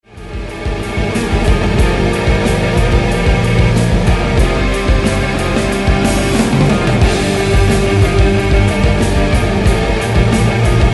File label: robot